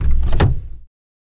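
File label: Chevy Corsica Door Open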